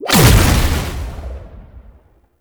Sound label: Safe Zone Activate; Gameplay; Warframe; Nineteeen Ninety Nine; Deep Archimedia